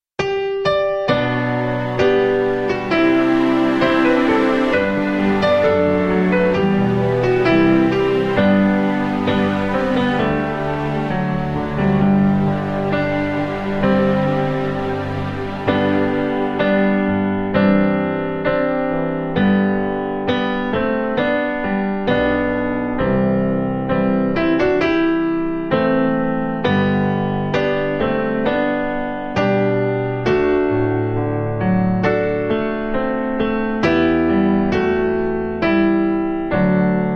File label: G To A No Backing Vocals, backing tracks